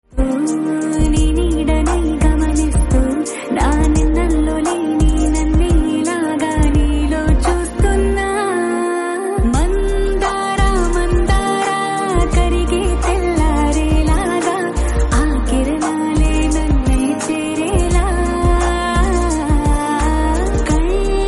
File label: telugu song